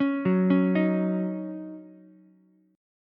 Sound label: D sharp Minor 7th, Ukulele; arpeggiated; JGuitar